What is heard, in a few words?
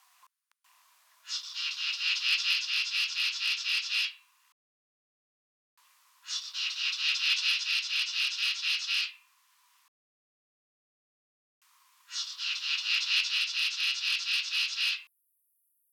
R germanica x10 strophes; Roccaverano-IT; J-Barataud